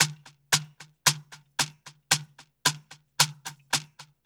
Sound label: SHEKEK L; Spectrasonics; Liquid Grooves 1 & 2